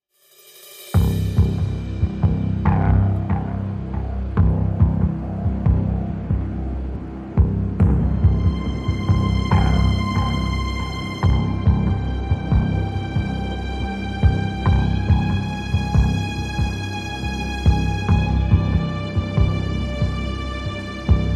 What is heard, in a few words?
Reduced Drums